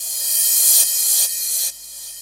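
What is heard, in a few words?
crash reverse, Dance Mega Drums; Best Service; Dance Mega Drums CD2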